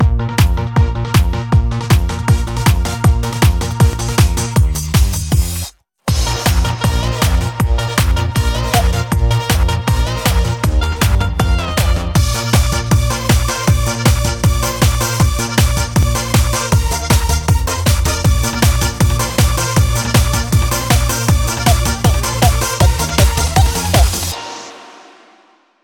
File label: BACKING; STICK SONG